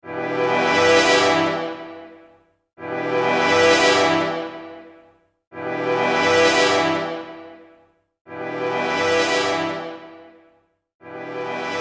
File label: Brass Swell; gamerip; Split/Second: Velocity